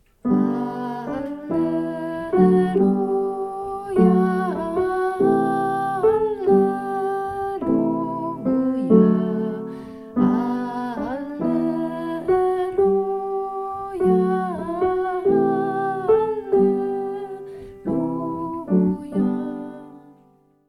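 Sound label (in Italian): Alleluia Irlandese, contralti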